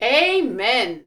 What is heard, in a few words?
laughs